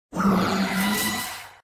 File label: cry; zeraora